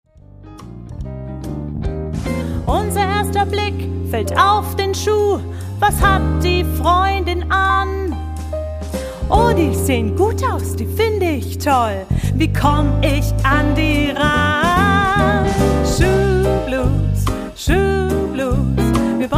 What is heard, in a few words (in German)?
Schuh Blues